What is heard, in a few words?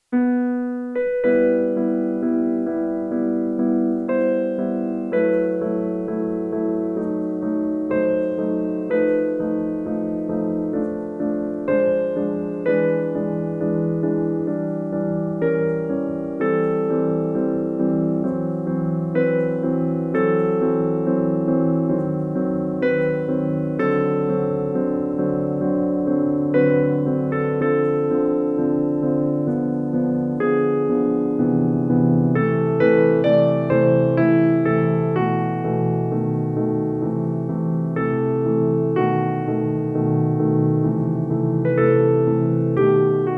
OASYS Kronos Full; Chop Prelude